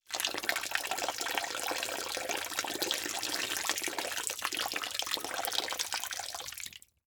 Liquid Water Water Pour Into Pool

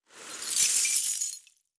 Impact Sweeping Up Broken Glass

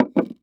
Foley Wood Board Set Down On Wood Surface

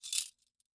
Foley Toy Handcuffs Moving

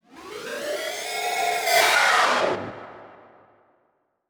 Title Transition Ascend; Production Element Title Transition

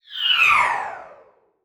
Multimedia Internet CD-Rom Flash Whoosh

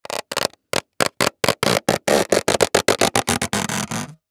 Cartoon Balloon Rub By Hands Creaky; AdobeCC

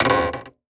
Foley Wood Squeak

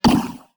Multimedia Internet CD-Rom Flash Blast

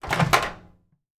Door Open Microwave; household

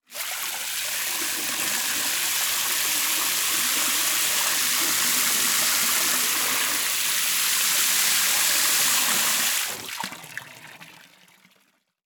Liquid Water Water Spray Burst Of Water Long

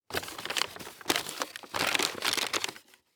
Household Paper Bag Rustle

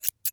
Scissors Snip, Household

